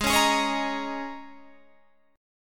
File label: Ab Suspended 2nd Suspended 4th Ab down; strummed; Absus2sus4